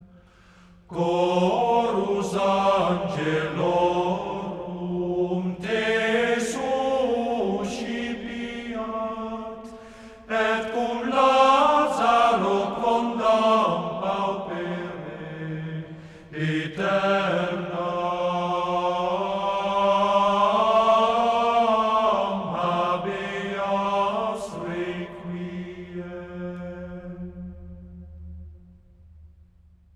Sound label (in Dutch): gregoriaans